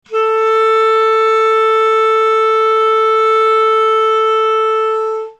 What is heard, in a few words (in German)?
Kammerton